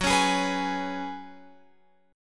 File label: F sharp Suspended 2nd Suspended 4th, Guitar, Open F; F#sus2sus4 strummed; x,13,13,15,14,15; chord shape